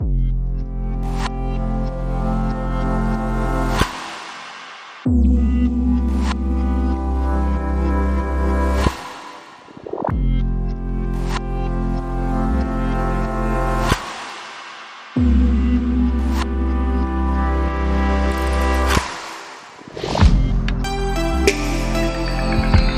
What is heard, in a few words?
VCR in Outer Space